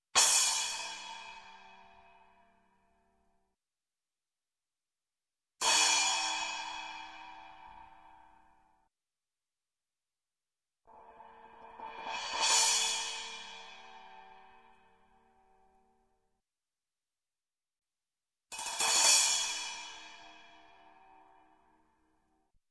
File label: Cymbal small Crash, Music, Percussion; Sound Ideas; Series 2000